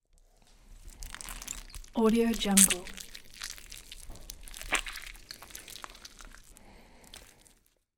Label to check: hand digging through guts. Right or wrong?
Right